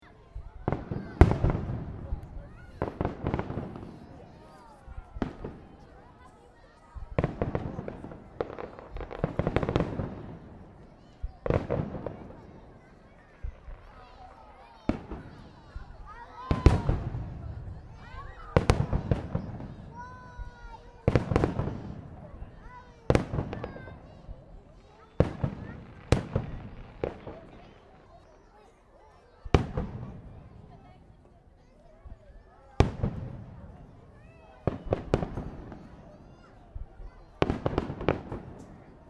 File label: Ambience Bendigo Parade Fireworks Display; Bendigo Dragon Parade, Easter 2009